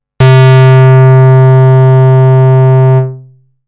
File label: Basic Pulse, synthlead